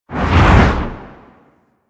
Dodge Roll Right Low; Movement; Warframe; Archwing

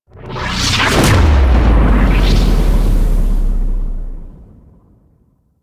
New Absorb End; Warframe; Nyx; Nyx Updated Sounds

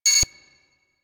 Bridge Comp Beep; Warframe; Liset; Hub

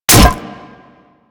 Light Glaive Catch; Warframe

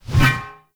Shield Whoosh; Weapons; Enemy; Shields; Sinecure Shield

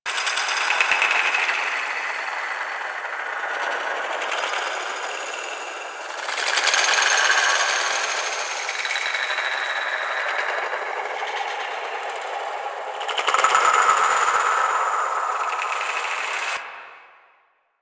Moon Glowing Tree Loop Small; Warframe; Ambience; Orokin

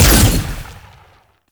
Fire Shot; Warframe; Weapons; Duviri; Incarnon Adapters; Incarnon Dex Sybaris

